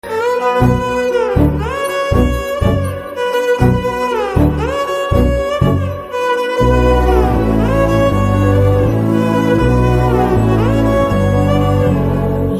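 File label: BGM Violin Ringtone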